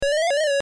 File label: Wolf Whistle